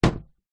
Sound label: household window close wooden old single pane